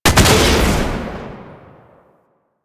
Sniper Alt Fire Shot; DE; Warframe; Frumentarius; Exalted Sniper

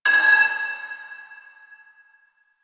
Pipe Cement Drop

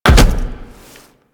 Land Heavy Default, Footsteps; Warframe; Tenno

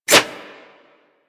Claw Swipe; Manic; Attack

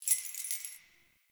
Keys Jingle; Warframe; Ambience; Nineteen Ninety Nine

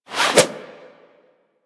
Zipline Brake Foley; Warframe

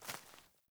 Boot Walk Veg, Footsteps; Characters; Enemy; Melee Grunt; Boots; Sinecure

